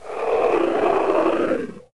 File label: Monster